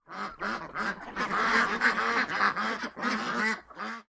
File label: Gooses